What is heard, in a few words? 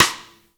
RIM